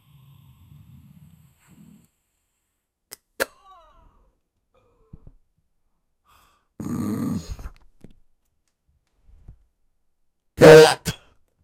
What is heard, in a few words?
smoking weed and yelling